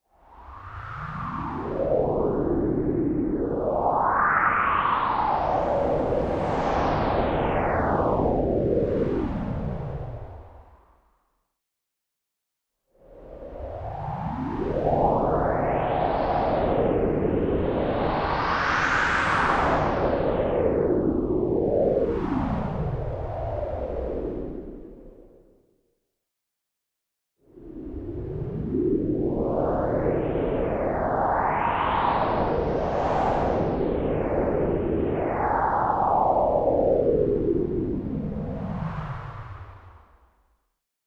MOVEMENT LONG Artificial Wind Gust Parallel Filter Modulation; boom library; Anime Essentials